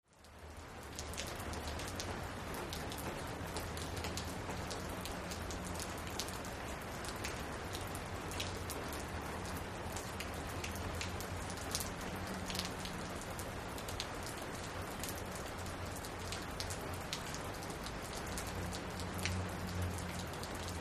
Rain On Porch Heavy

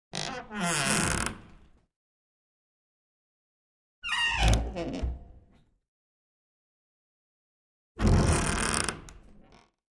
Door Wood Creak X3; hinges & creaks

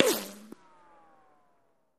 Bullet Ricochet Sin

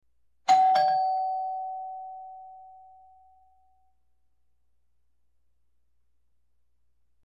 Good Ding Dong Door Be, doorbell

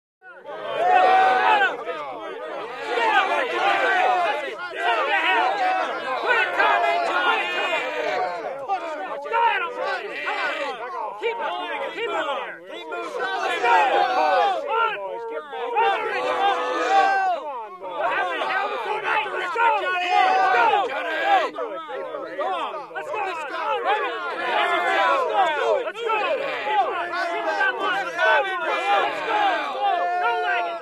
Battle Scene Angry Ha, civil war battle

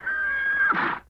Horse Whinny Neigh

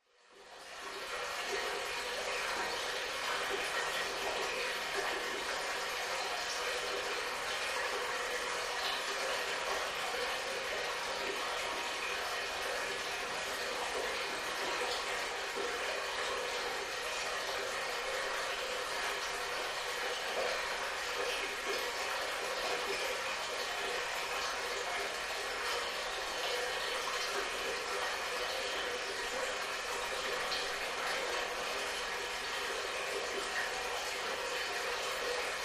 Sewerage Amb Easy

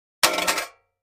Candle Holder Drop On, crashes & creaks

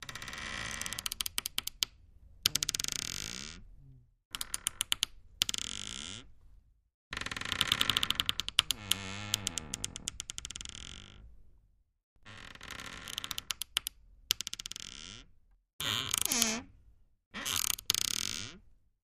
Door Wardrobe Creak Thin; hinges creaks

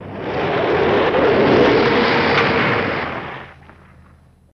Car Lng Skid Peel Out O

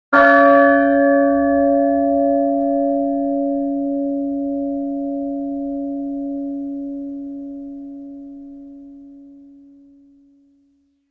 Church Bell Single, bell towers

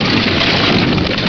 Plaster Falling Bre

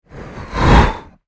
Quick Whoosh Flying, whooshes

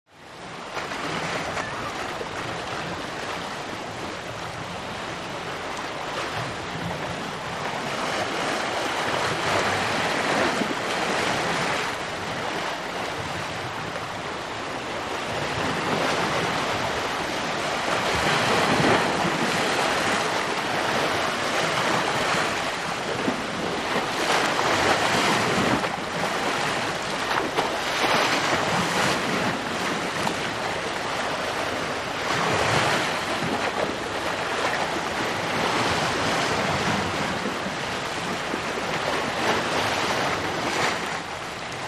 Bow Wash Ship

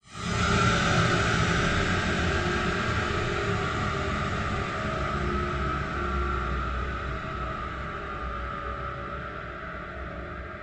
Horror Morphed Metal, eerie ambience

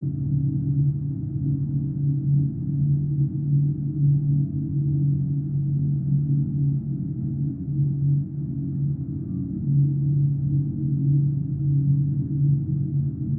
Ambiance Airy Bass, drones & tones

